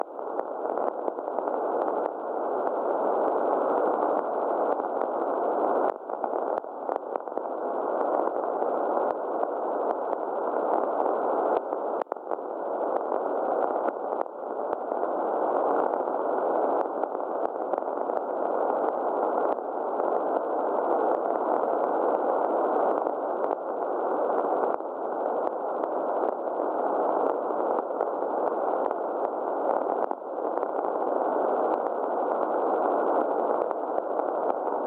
Receiver Free Frequency Only Atmospheric Crackling